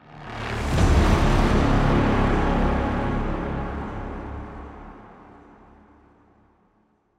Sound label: Pause Menu Intro